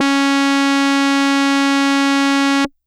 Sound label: Hard Brass DX100 Cs4